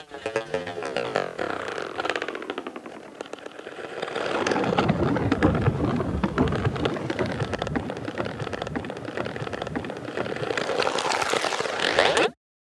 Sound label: Fx; Vignette Kit